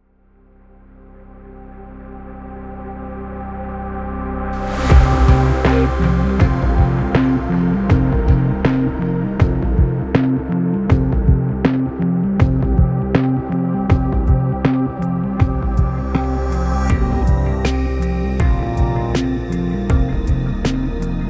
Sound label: Music; Mirror's Edge (2008); ArtArea